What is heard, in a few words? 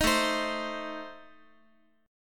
A Diminished A, Guitar; 4A,4E,5A,5D,5G#,6C#; strummed; JGuitar